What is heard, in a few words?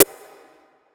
Hihat; Ghosthack Advent Calendar Day 4